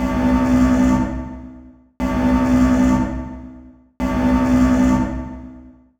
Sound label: UFO Warning